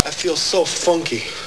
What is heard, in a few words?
funky